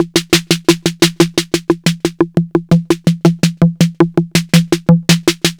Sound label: 808 tape snare